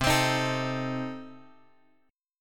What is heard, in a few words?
C Minor 6th, chord; Cm6 Chord {X 3 X 5 4 5} strummed